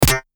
Game, transition

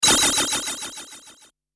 Game, transition

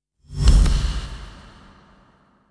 Simple, transition

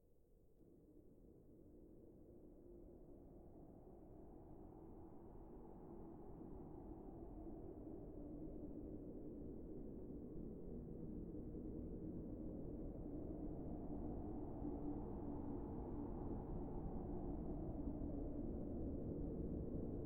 wind ambience

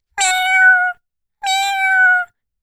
cartoon kitty begging meow; gorbe

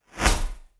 Glitch, noise

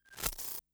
Glitch, noise

